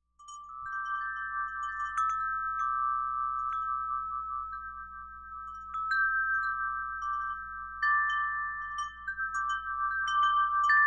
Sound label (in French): carillon moyen habitat papillon bronze